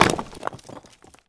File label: Hit Rock; Civilization4